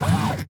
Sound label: roboport door; factorio 2.0.55